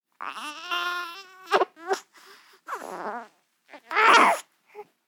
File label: baby three weeks old cry